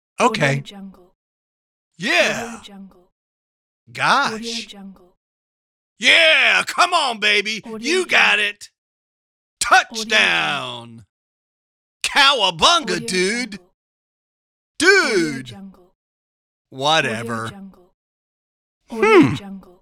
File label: male expressions